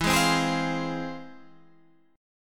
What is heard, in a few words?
F Minor E; Fm/E strummed; JGuitar